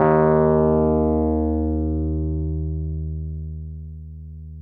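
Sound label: Wurlitzer electric piano; Sonic Reality - Vintage Timetraveler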